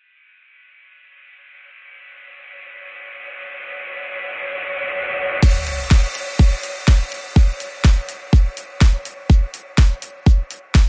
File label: Beat